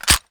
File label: cover down